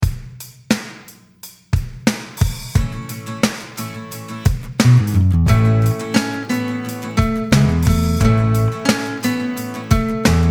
Orig Gtr neue Instr

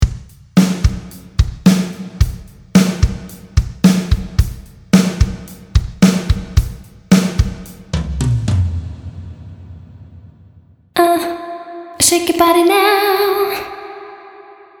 drums und Voc Room Modern

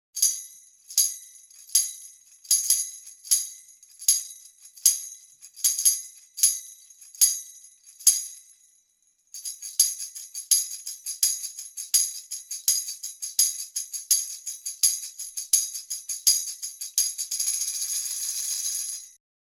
Schellenring

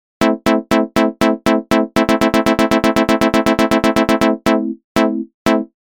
notenwiederholung mod-wheel

